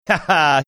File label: ha haa